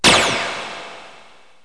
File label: SHOOT, 射击; 战争; 特酷声音素材库